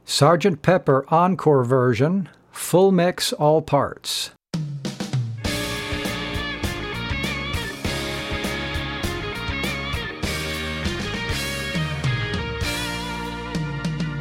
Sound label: FULL MIX ALL PARTS; practice tracks for singers